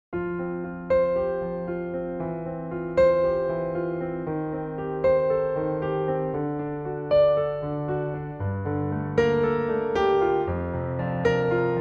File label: F piano